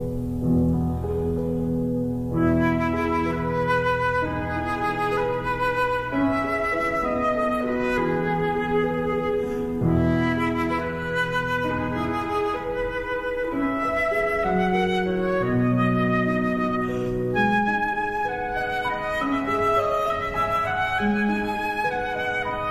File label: Berceuse (Lullaby) for flute and piano